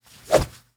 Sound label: Punch Kick; Melee; Body